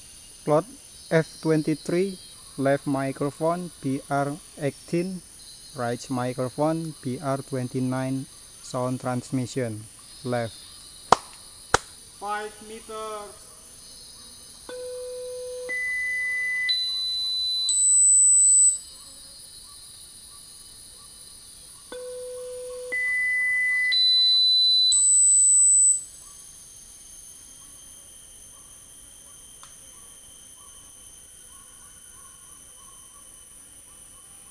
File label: birds